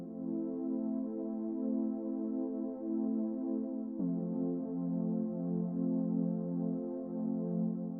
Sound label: Trance